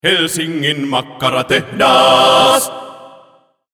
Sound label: jingle; Helsingin makkaratehdas; mainosmusiikki